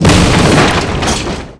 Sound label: Tunnel Colapse; GreatEscape3